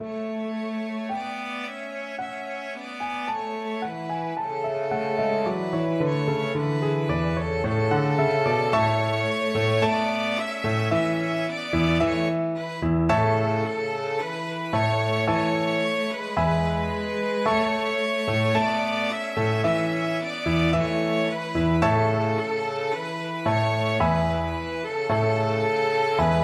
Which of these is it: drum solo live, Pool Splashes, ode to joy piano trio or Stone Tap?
ode to joy piano trio